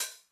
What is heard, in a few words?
Hat Open Min Decay, 909